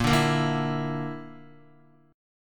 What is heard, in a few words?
A sharp Major Flat 5th A sharp down Guitar; strummed